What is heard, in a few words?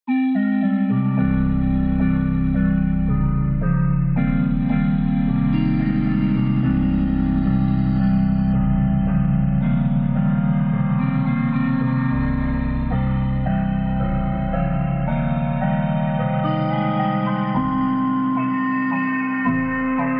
Everything Marimba; soniccouture flowstate; Kontakt